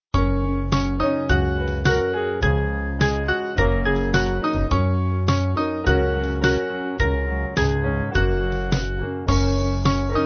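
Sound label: Band, Music Backing Files for Churches; Holy Spirit; Holy Manna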